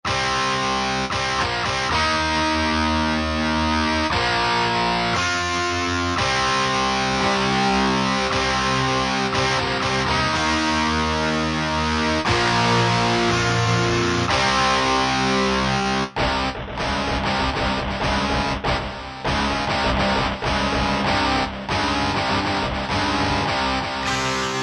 HEAVY. EG, Guitar And Keyboards

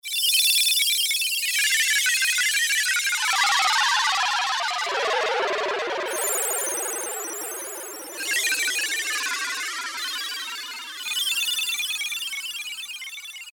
Deep, Roland U-220; Factory Timbres

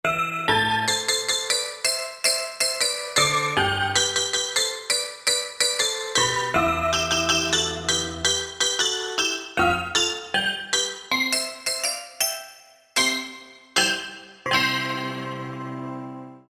Glass Perc, E-mu Proteus VX

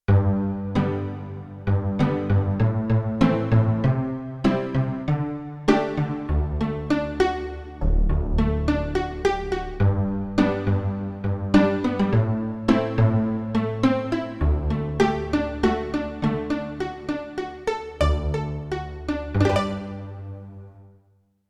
Pizzicato, Spacey Keys; Yamaha TX16W; Spacey Keys 2

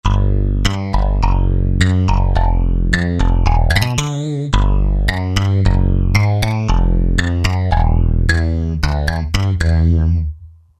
Structure Bass, Roland JD-990; Factory Patches

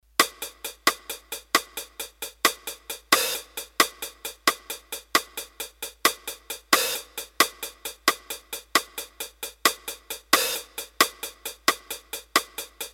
Bossanova, Roland D-10; Rhythm Demos

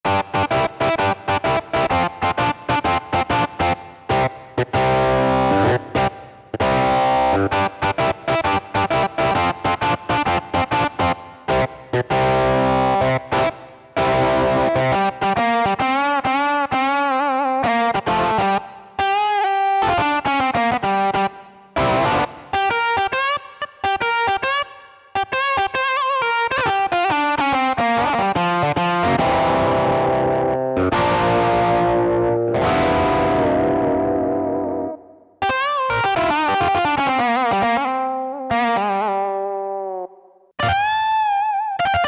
BLUES OD, Roland SC-880; Factory Patches

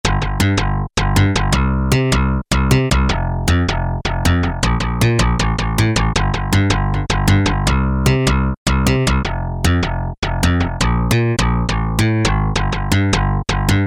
Round Synth, Peavey DPM Spectrum Bass

